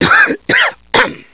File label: cough, scientist